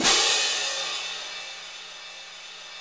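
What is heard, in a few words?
orchestral cymbal; InVision Interactive; Lightware VOL-1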